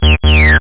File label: acid